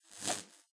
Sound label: sparks